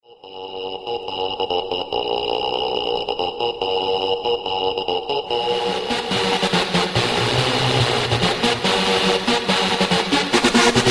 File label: Techno Beat